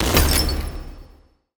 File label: Stars Explode; portal quest; android